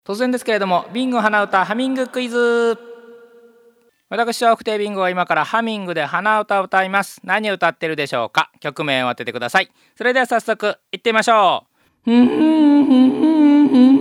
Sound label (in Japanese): hanauta